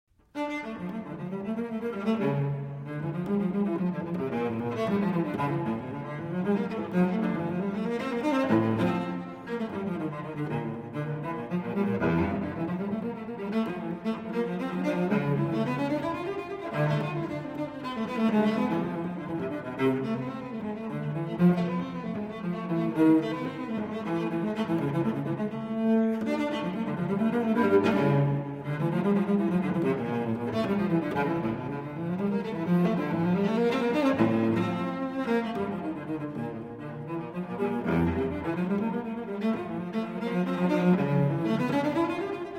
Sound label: Unaccompanied Cello Suite No. 2 in D Minor Courante; BWV 1008